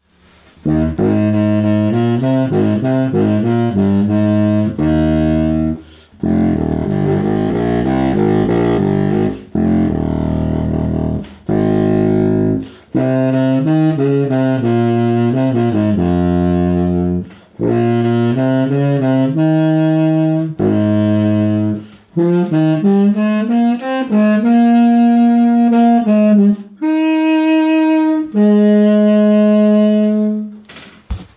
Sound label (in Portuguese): contrabaixo hino